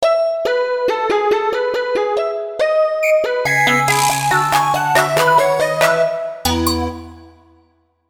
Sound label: jingle